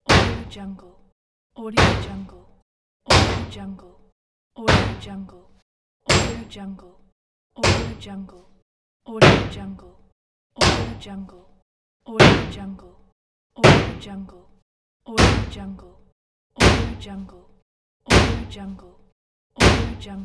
hammer

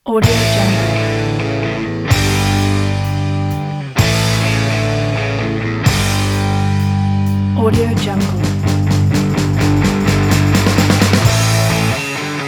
punk n roll

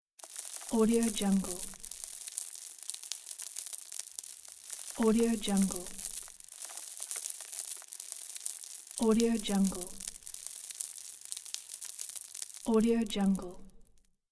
sand and small stones spill

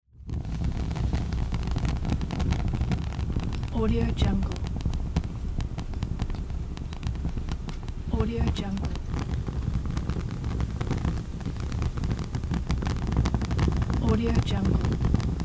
fire

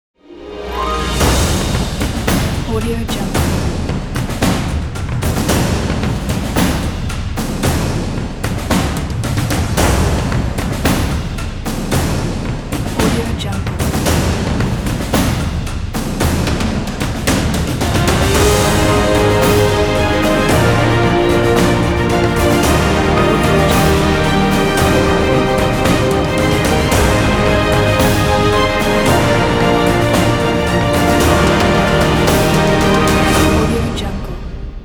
epic celebration

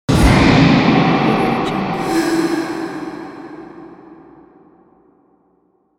horror tension thriller

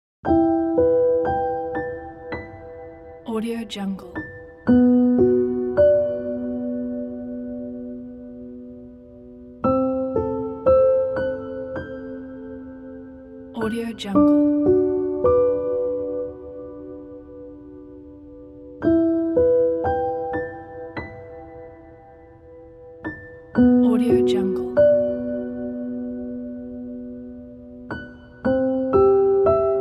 soothing piano melody

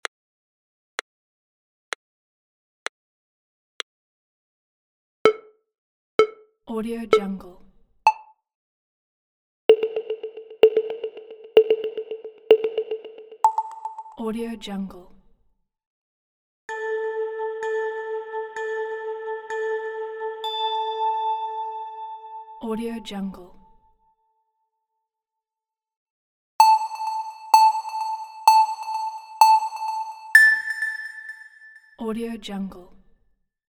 game start countdown

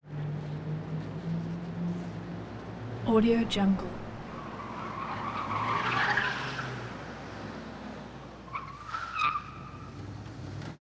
vehicle skids fast approach skids

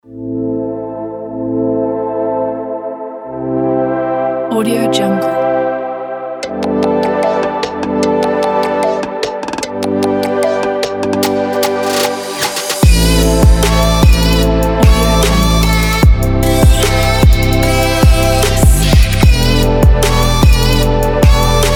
the chill trap

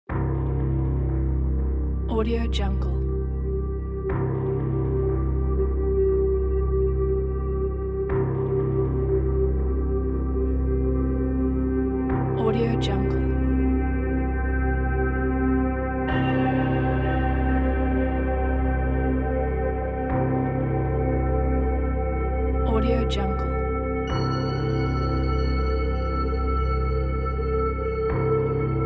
dark ambient

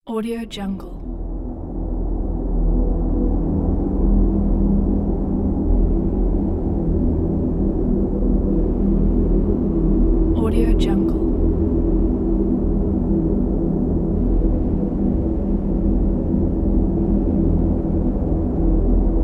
desert wind ambience; phillipmariani

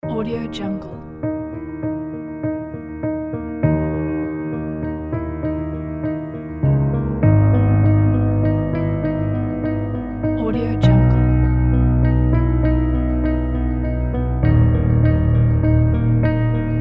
mystery crime drama

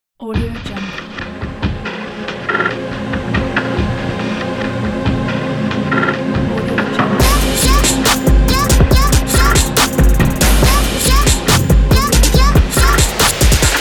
urban logo

